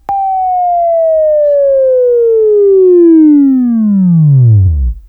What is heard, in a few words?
Buzz, Right; Sweeping Training